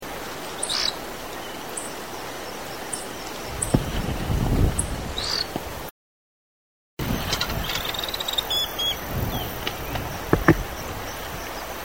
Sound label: lark like brushrunner